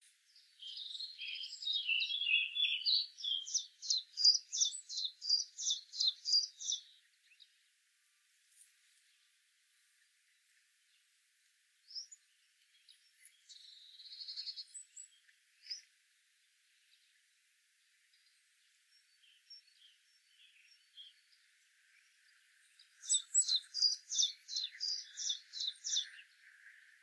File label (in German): zilpzalp